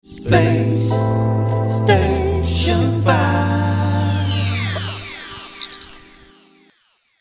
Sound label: chant